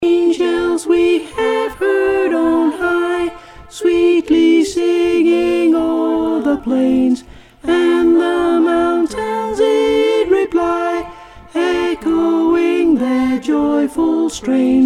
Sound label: Angels We Have Heard On High Mezzo; Carols; Choir Harmonies; Choir Harmonix